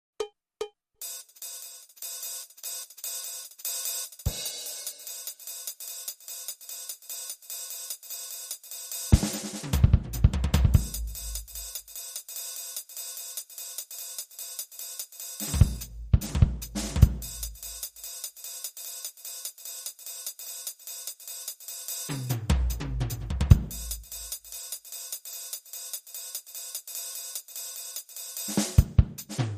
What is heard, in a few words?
BeBop Loop